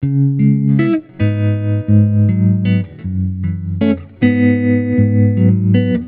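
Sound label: riff b maj 79bpm processed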